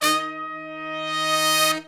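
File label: BRS longs, R&R horns section; Roland L-CDX-03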